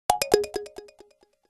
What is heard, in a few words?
Alert Unlink; HTC Ozone